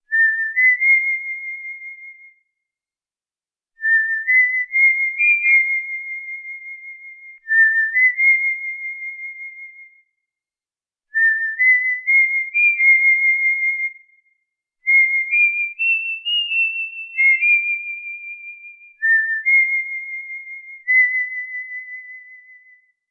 whistle

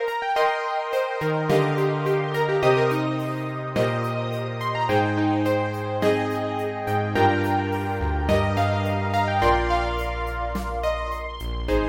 keys; sonic kaleidoscope

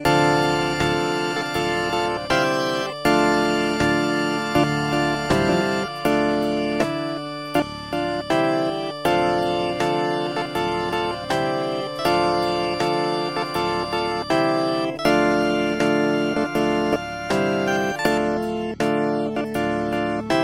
vox corinthian transistor

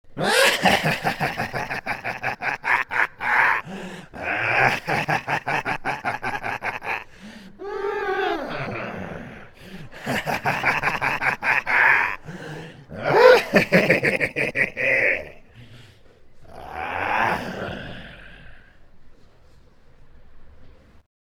laugh stereo

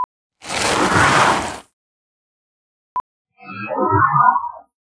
scuff

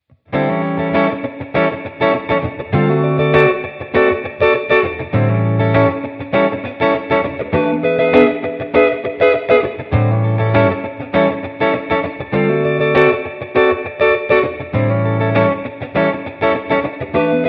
distortion wet

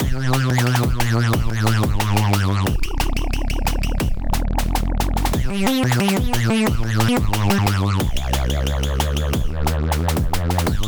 throaty vibrations

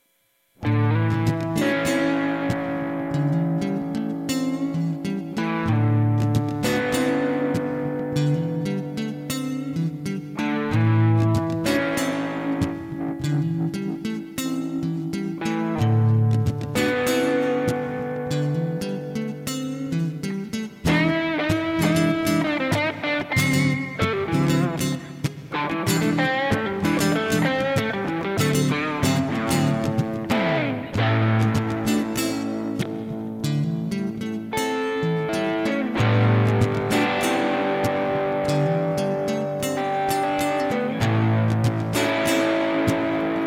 funky

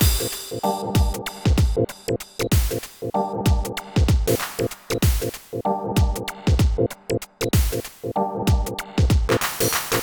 jazzy dot matrix

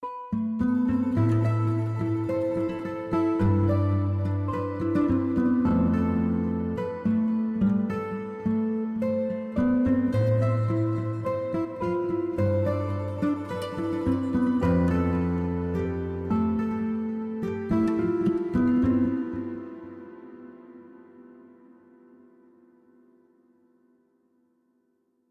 harp guitar melody

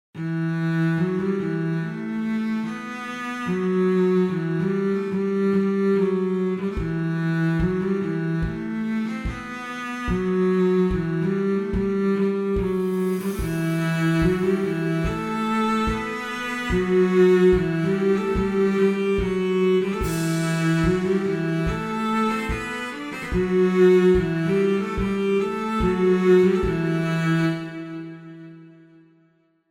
strings cello; captains strings

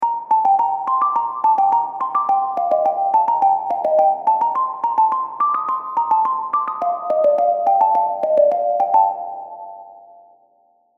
serum plucks; chiqui audio

